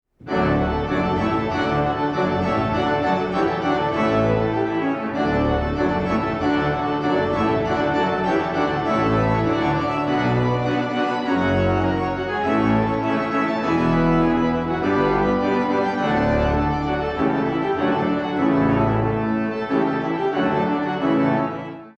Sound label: Fanfare; Lemmens; wedding recessionals